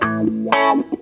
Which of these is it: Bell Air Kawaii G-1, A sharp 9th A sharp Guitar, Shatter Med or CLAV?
CLAV